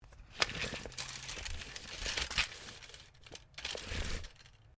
TAPE MEASURE METAL EXTEND, Common metal tape measure; Hand Tools; Sound Effects:Tools